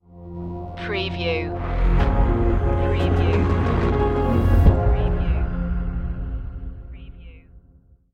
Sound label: mournful regrets sting